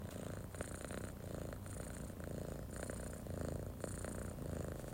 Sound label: purring